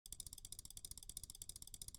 转轮声

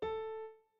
院长房间 钢琴

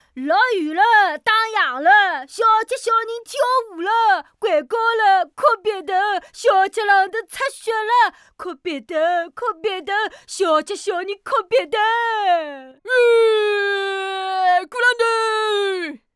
纸杯电话小男孩童谣; 配音

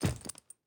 打开背包

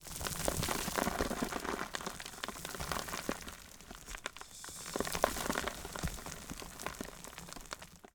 坍塌

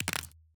咔嚓转头

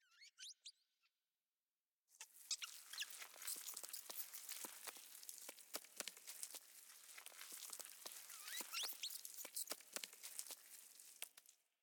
老鼠

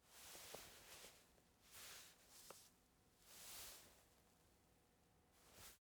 爬行

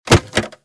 关盖子, 八音盒

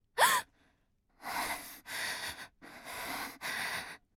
惊醒喘气; 小蝶惊醒喘气

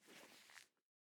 绳子特写

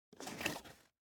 木板移动

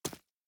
奔跑 右

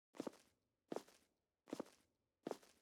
车夫脚步

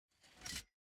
插销声

